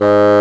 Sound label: Orchestral Winds Bassoon; Roland LCDP04; WND Bassoons